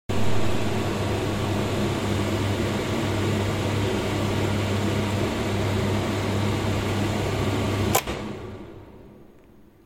Dunstabzug

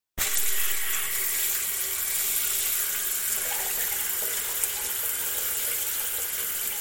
Duschwasser

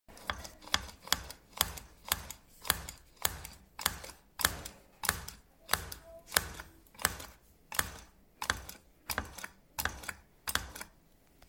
Locher

